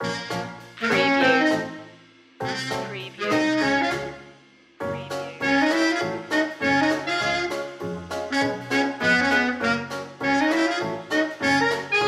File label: bugsy bop loop